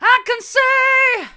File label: i can see hard, vocal